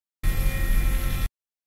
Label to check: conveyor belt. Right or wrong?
Right